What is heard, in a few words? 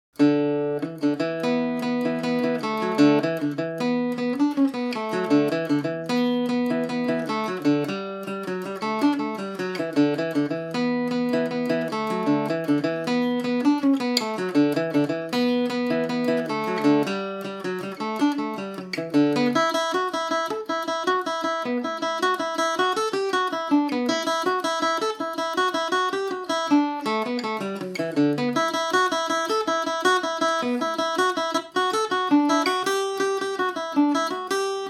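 E dorian, Irish mandolin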